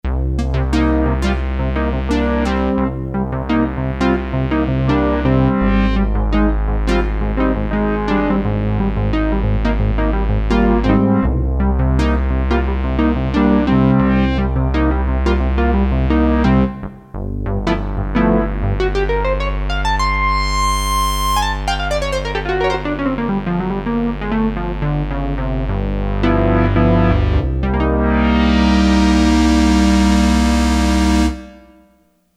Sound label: NEG PLUCK, Roland Jupiter-8; Factory patches